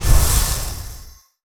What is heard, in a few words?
warrior quick attack